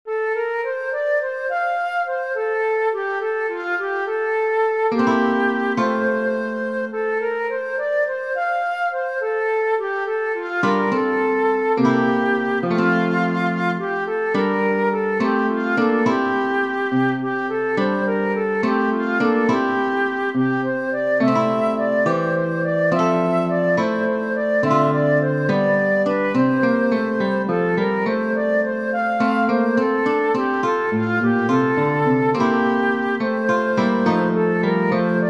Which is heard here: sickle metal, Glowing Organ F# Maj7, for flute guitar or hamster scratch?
for flute guitar